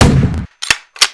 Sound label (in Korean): hit 샷건